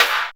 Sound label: snare, Rave Kick Snare, Percussion; Best Service ProSamples vol.37; Dance Synths